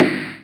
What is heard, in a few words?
Percussions